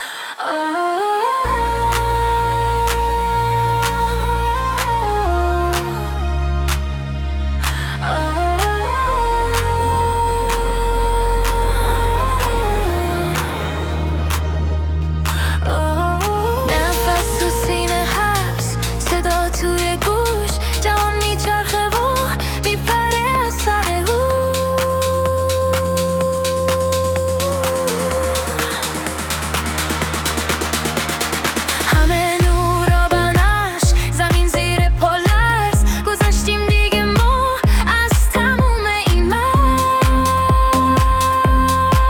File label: Pumping house beat rising synth leads